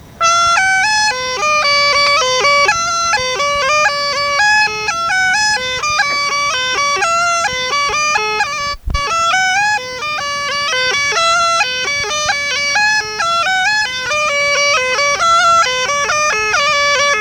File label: Varnenski melody minor, Small Gajda; Varnenski 9